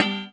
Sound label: ting; Punches Hits Discoblasts